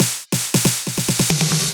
Percussion Fill

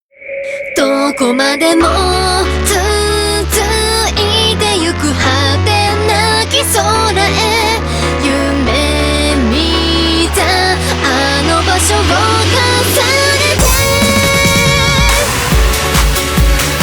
Distorted Overdrive

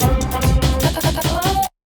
FILL, PsyTrance

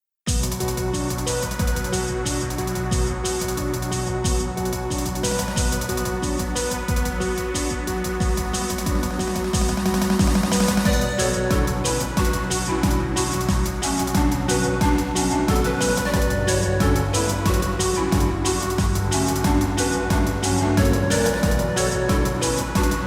Ambient

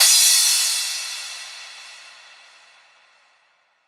Crash

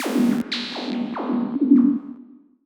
Zap, PsyTrance

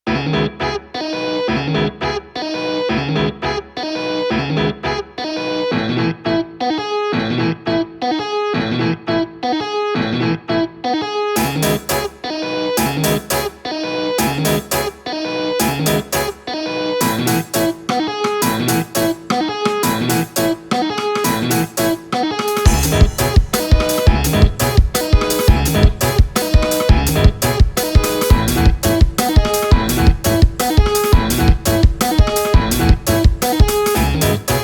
Off Vocal

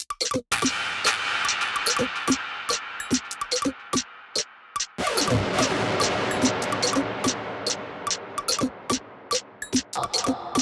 Loop, PsyTrance

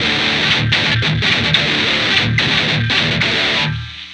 guitar; LiLA'c Records PsyTrance Sounds 01

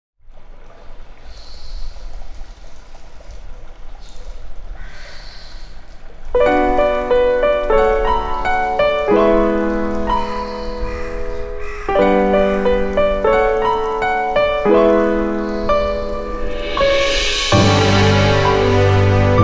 Tohno soundscape

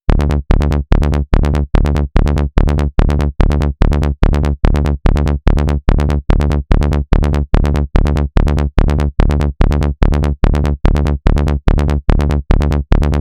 Bass Fullon, PsyTrance; LiLA'c Records PsyTrance Sounds 01

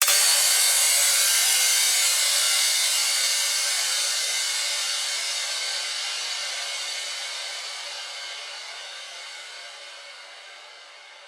Crash

